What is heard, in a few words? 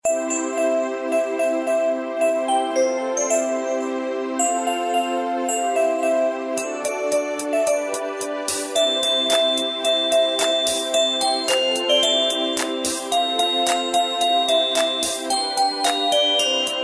Christmas Ringtone